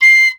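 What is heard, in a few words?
Piccolo Staccato C6; Flutes; DirectWave; Varazdin Orchestral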